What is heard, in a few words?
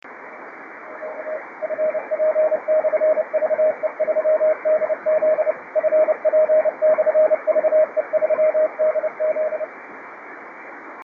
50.104 MHz CW, ham radio; FP/VE3DZ GN17 Saint Miquelon Island, Canada